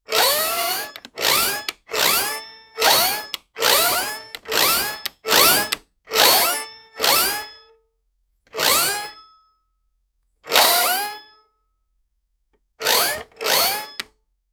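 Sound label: scifi electric manipulator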